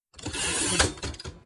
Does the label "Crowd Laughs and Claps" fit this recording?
No